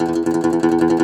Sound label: DOMRA, Segundo Domra, Plucked Strings